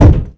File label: Door Generic Thud; Playable Tutorial Level